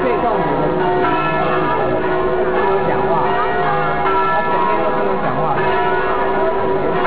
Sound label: bell, italy